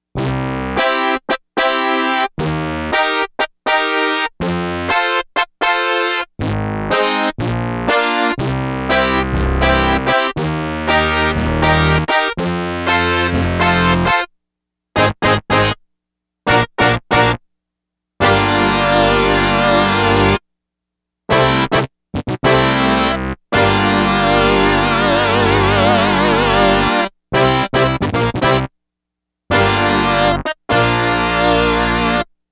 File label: PowerBrass, Yamaha DX100; Factory Patches